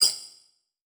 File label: Multimedia Internet CD-Rom Flash Short